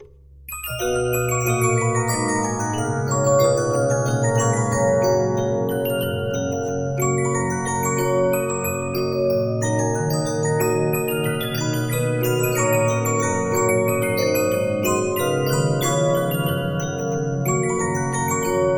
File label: Regina, 15inch disc musicbox